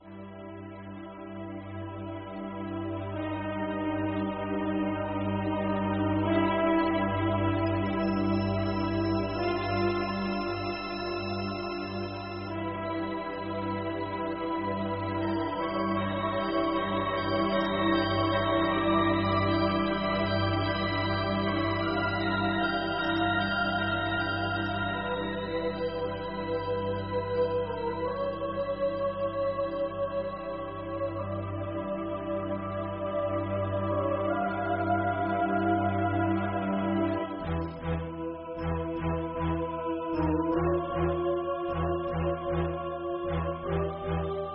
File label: Negative